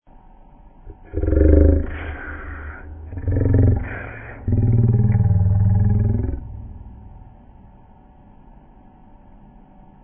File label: growl